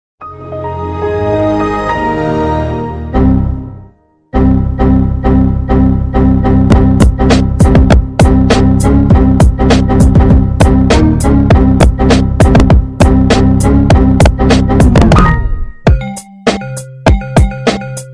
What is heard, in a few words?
Error Remix, sonnerie